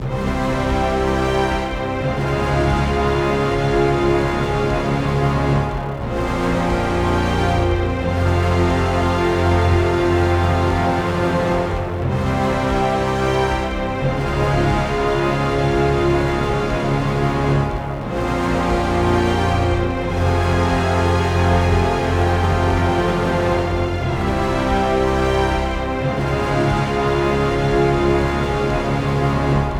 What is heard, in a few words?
Boss Loop 120bpm; Planet of Lana; Orb Boss v030523 B